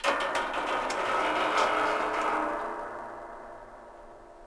creak metal; Star Wars Obi Wan; XBOX

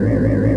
crystal pods, movers objects

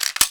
menu stop; Freestyle Street Soccer; XBOX

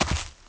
step grass; people; Pirates Of The Caribbean

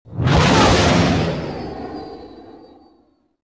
xwing by short; movers objects

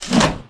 menu fly in; Tony Hawk's Project 8; XBOX

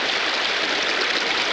waterfall SM LOOP; Cars; XBOX

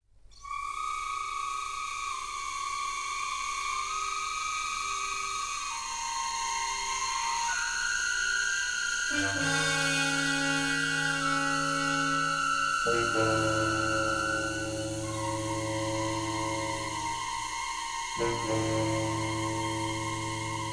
suspense, music

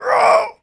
Player Grunt

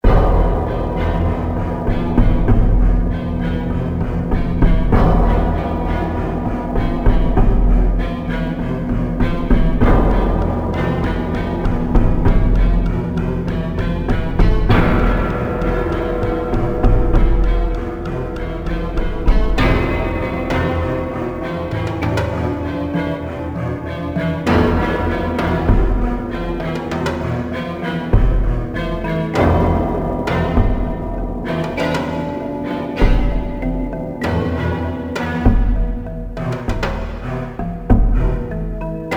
slow, music; Soldier Of Fortune II Double Helix; XBOX; colombia

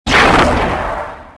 lightning, weapons force

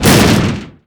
Medium Impact; Totaled; XBOX

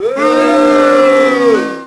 boo; WhiteOut; XBOX; iceberg

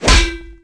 pipe impact; Tony Hawk's Project 8; XBOX

